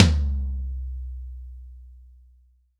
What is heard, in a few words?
long tom sample d key; Samplerate 44100 Hz